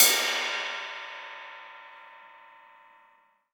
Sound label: sfx perc